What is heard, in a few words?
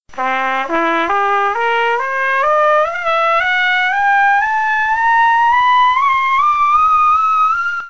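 natural horn harmonics c ascending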